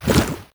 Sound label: tarmonster chase; Crypt of the NecroDancer